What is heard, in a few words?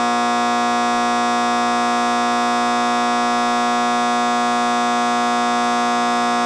ambience electric hum